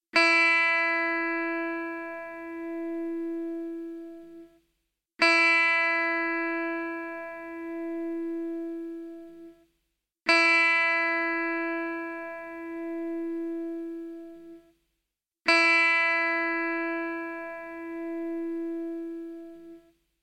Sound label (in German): Stimmton e´